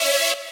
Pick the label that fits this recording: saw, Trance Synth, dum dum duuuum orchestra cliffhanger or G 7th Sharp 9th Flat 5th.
saw